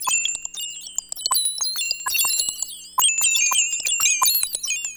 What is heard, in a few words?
GLASS RODE; Rarefaction; Poke in The Ear With A Sharp Stick